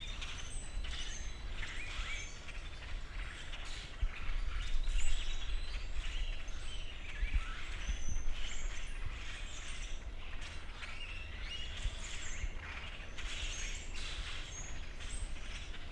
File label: Bird ambiance